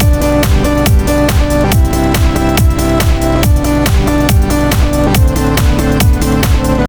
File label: bright melodic loopy edm